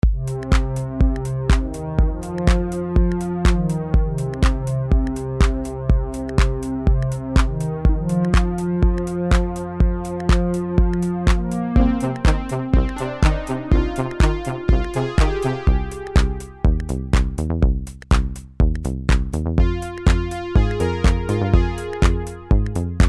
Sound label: Roland JU06 Roland Juno106 the Mix all Junos